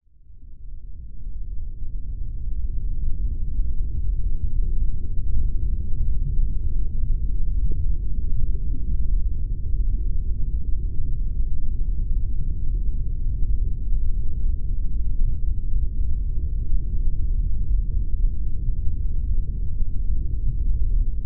nature underwater ambience deep

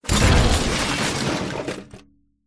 impacts junk hard crash metal plastic wood glass

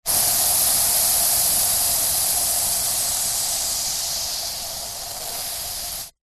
household aerosol spray run out

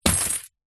money pouch fabric coins down on surface; foley

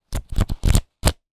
money notes bills wad flick through; zapsplat

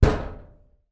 impact metal panel door resonate

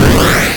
leisure video game retro 8bit explosion

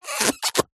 cartoon suck pull release

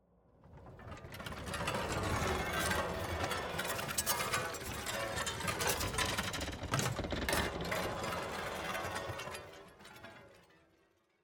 GHOSTS Tremor; Deconstructed Reality

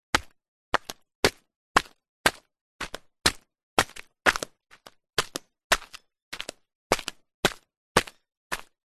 footsteps walking up stone steps flip flops; foley; zapsplat

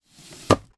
household bedside cabinet drawer open

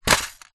leisure game pocket travel down on wooden surface; mastermind; zapsplat

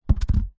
household toilet roll down on surface; zapsplat

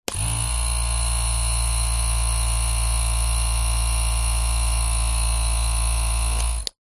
household back massager electronic on vibrate off; zapsplat

